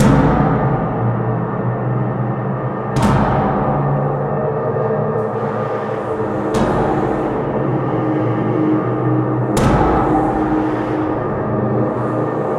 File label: pistol drone